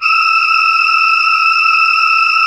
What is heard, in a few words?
SYM CHOR E 6, Korg; SYMPHONY; Back In Time Records; Korg Universe VOL-1; Korg SYMPHONY2